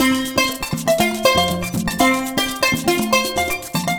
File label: Calypso Steel, ringtones; Google; NewWave Labs